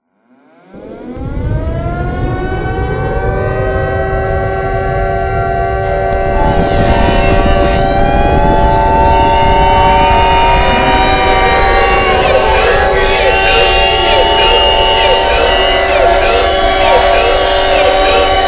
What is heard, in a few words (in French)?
alerte